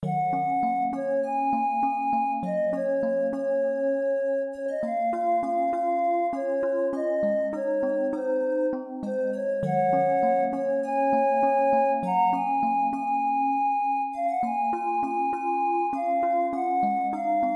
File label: Relaxing